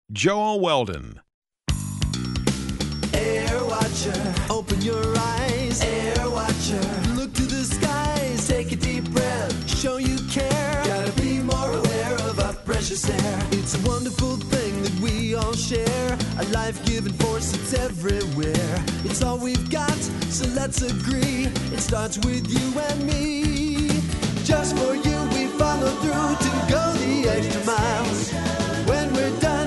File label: singing